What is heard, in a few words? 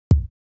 Kick Stomp; hydrogen